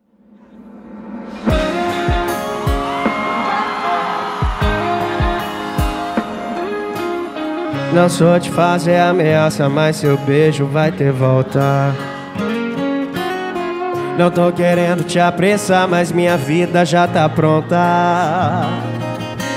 funk e sertanejo

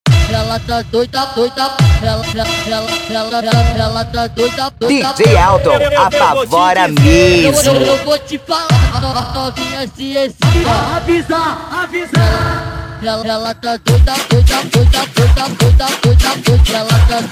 eletro car automotivo

